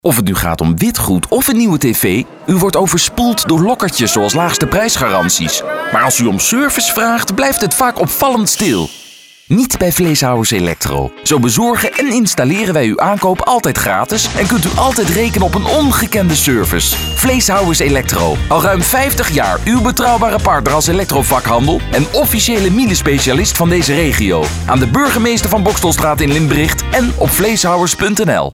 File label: Electro